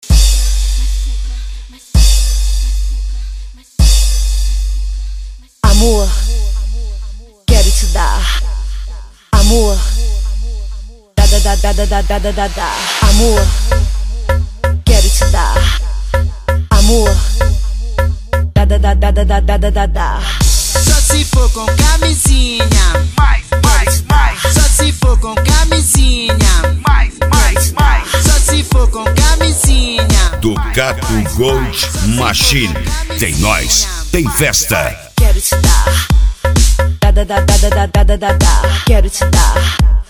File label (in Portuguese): eletrofunk